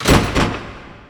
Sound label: door lock; SE KALEID DOOR LOCK; prism